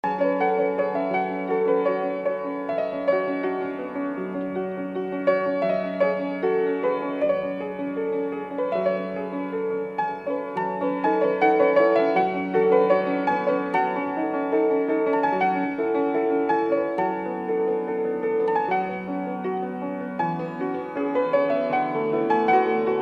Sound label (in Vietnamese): nhac khong loi viet nam; VOV3